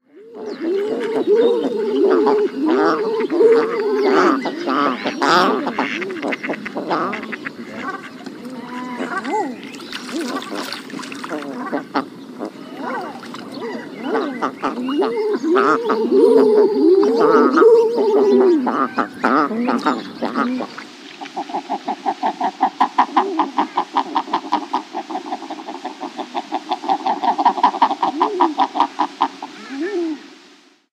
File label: King Eider; Birds